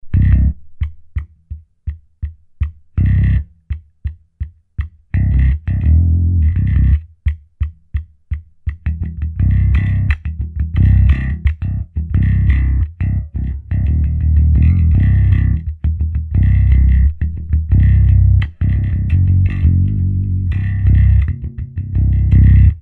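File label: Bass ribbon mic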